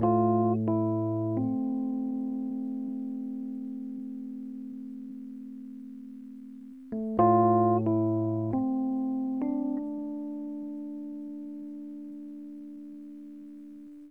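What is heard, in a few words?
rhodes, D minor, Hip Hop & RnB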